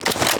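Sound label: weapon foley fn2000 reload first lift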